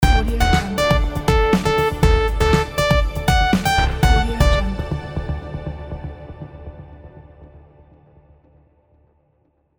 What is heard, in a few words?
logo ident intro